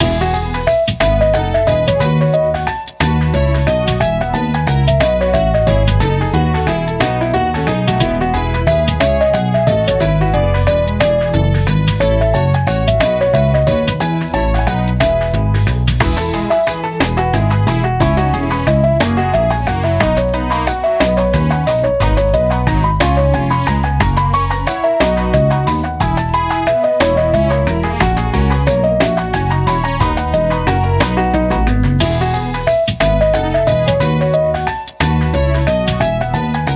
bachs violin concerto as piano play 3rd m fragments bwv 1042